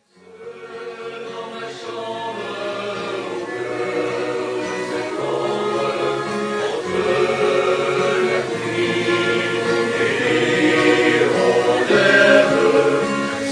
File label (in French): Au coeur de septembre SATB Sol diese mineur; Fantasticks